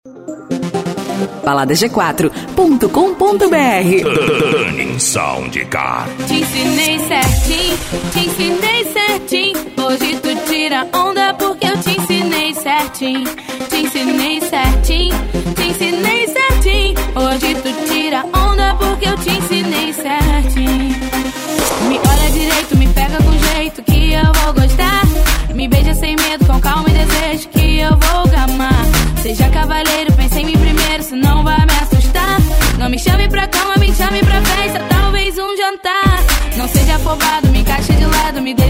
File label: tunning sound car; g4 tuning sound car